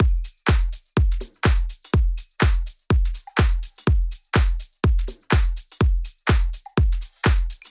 Drums Clean Before

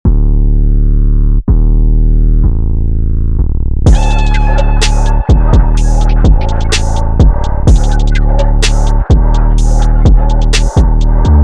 Aggressive

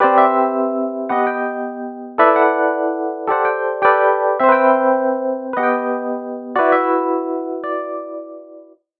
Chords Rhythm Jazz; midi wizard

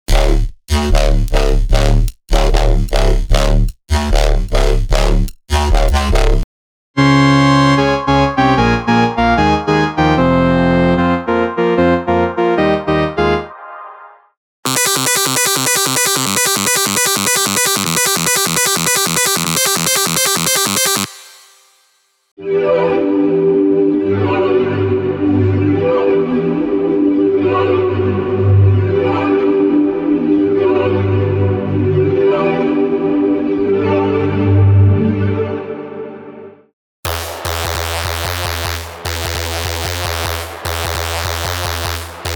Dubstep Full; unisynth

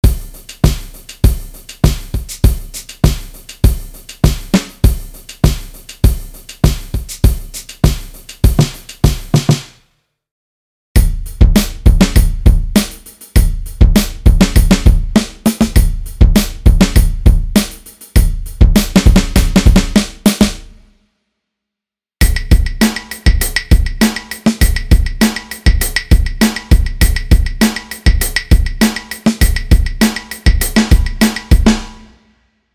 Country

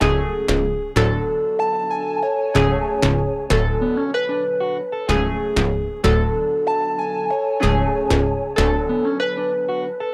189 BPM Bbm; Gifted

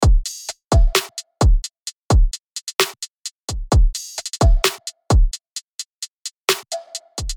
Trap 130 BPM

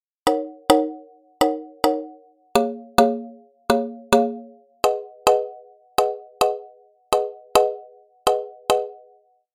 Chords Rhythm Reggaeton; midi wizard

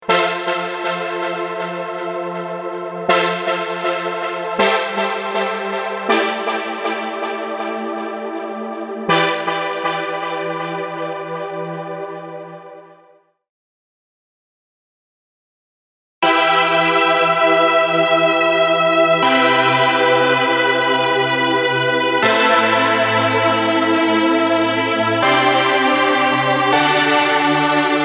Chords Synthwave Synth Pop

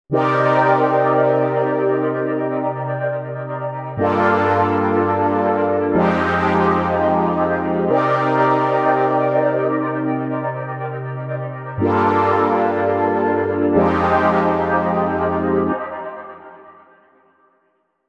Chords Psychedelic After

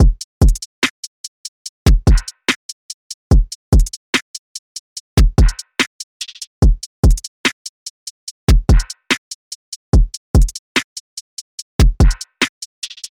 Drums Dreamy Before

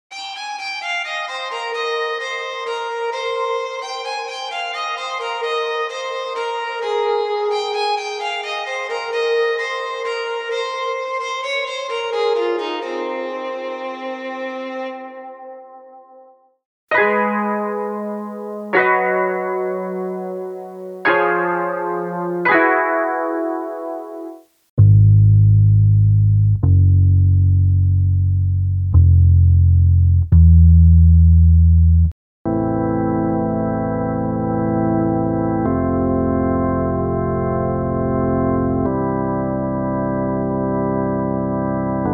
Country; unisynth

